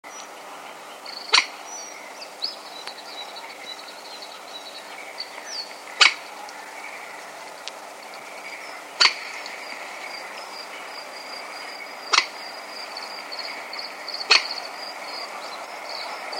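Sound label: common moorhen voice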